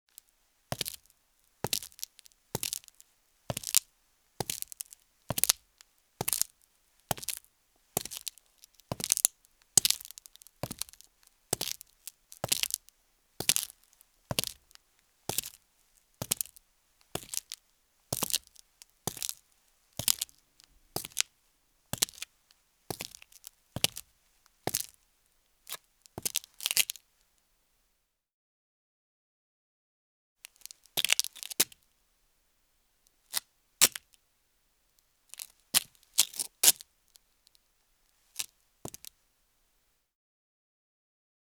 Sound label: Footsteps, Foley; Sound Ideas 6016